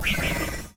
darksaber on, lightsaber

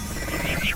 darksaber off, lightsaber

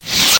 saber off fast; lightsaber

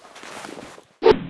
sledge swing

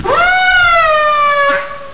momma idle

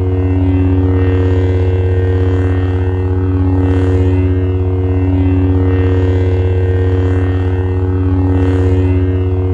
saber loop; lightsaber

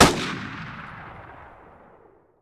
rifle fire

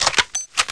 rifle lever

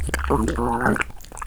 frenzy, banshee; npc

